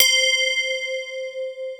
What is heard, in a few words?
JD990